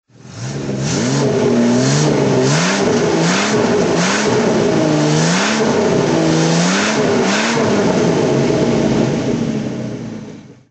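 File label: E-type v12 automatic throttle; Jaguar E-type V12 2+2 coupé, 1971; Engine sound; V3D/ ClassicarImages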